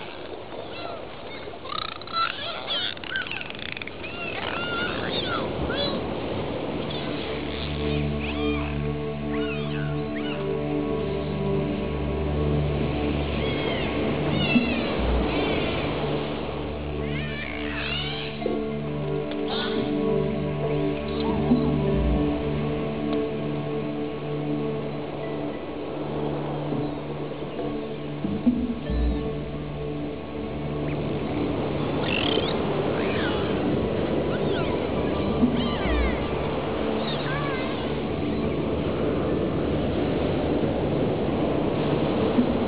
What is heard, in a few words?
dolphins